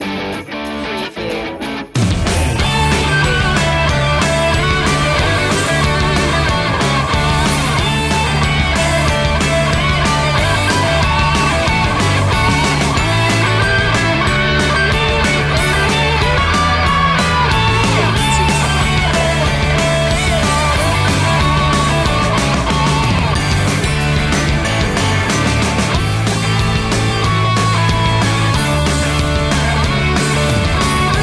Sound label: deck the halls green remix solo